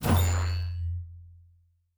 Shield Device Stop, Electric; Sci-Fi Sounds

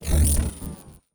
Device Stop, Electric; Sci-Fi Sounds

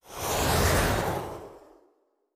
Weapon Shoot (Rocket Launcher); Sci-Fi Sounds

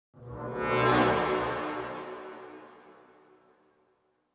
Suspense Transition, Horror Sci-Fi Music

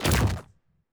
Weapon Shoot; Sci-Fi Sounds

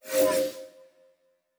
Teleport, Sci-Fi Sounds

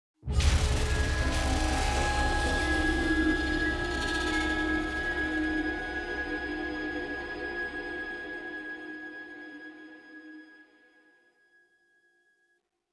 Opening, Classic Horror Sci-Fi Music; Dead Ark

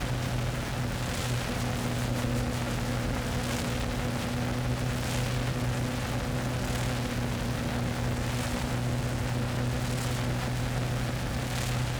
engine loop, sci-fi sounds, mechanical

